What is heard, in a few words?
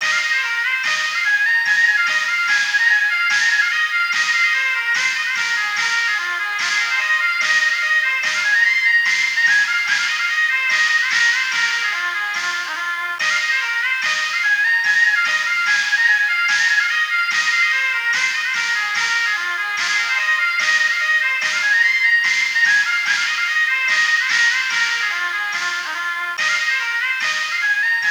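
background music asian; yakuza ryu ga gotoku kiwami 2 pc gamerip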